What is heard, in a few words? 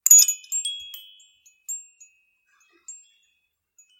doorbell